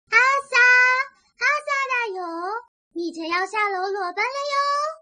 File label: ring